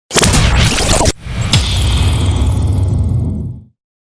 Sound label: Galery Noise; Sound Ideas - Galery Noise 001